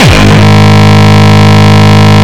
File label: Longkick, kicks